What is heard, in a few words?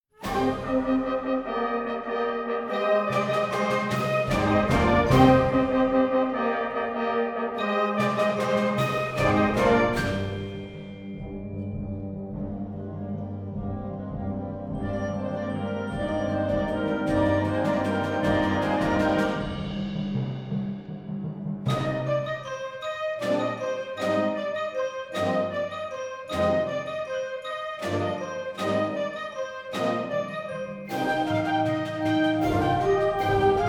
Ukrainian Bell Carol